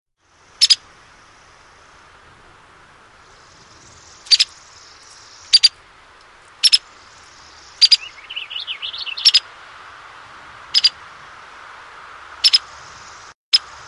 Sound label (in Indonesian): pelatuk kepala putih